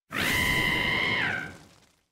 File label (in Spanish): Grito de Cinderace; Categoría:Cinderace